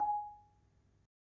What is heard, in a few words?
Marimba p G#3; Terkelsen